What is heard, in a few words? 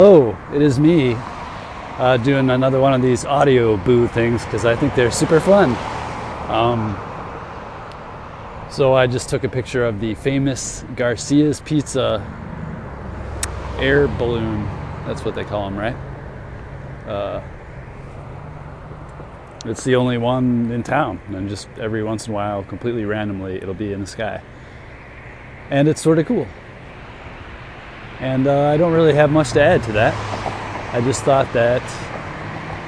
balloon and water tower